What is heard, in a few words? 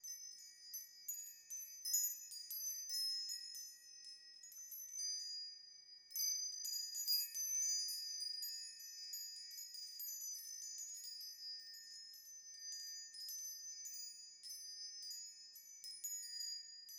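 ambience China 3D ambience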